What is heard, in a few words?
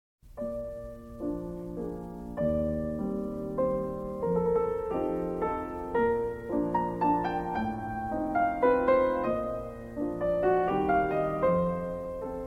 Cantabile in B Major, piano